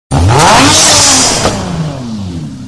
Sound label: engine sound; games; ringtones